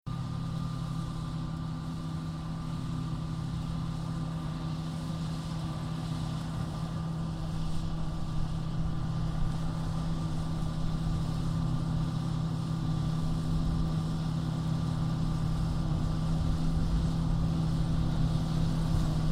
Industrial Mechanical Agriculture Combine Harvester Passby Slow Harvesting R To L; Tractor